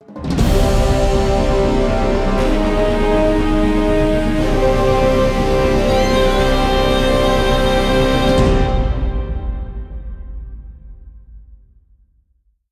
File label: Mission Success Festival